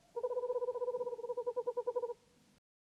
owl; soundscrate

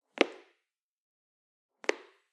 walking indoors single step; soundscrate

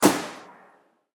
bullet impact fabric

